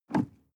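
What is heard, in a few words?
sports car interior pedal gas step medium; verkehr transport; autos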